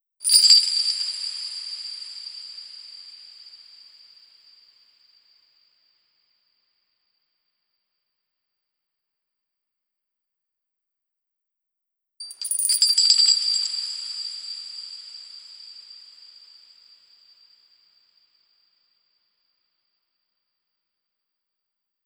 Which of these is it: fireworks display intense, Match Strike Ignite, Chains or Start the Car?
Chains